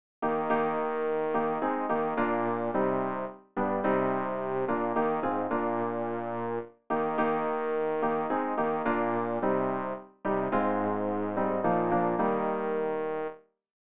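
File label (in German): bass, Übehilfen; Ref. Gesangbuch; RG 071: Laudate omnes gentes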